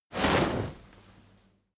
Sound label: crowd movement single hard; bewegung; soundpool